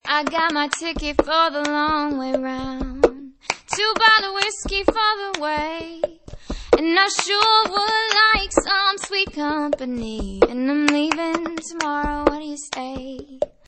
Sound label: Pitch Perfect